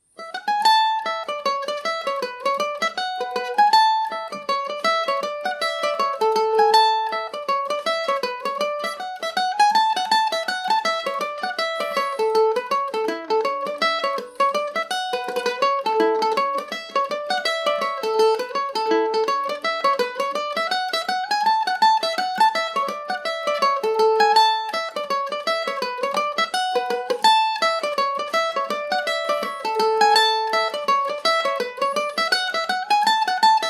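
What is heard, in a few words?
A major, irish mandolin; linen cap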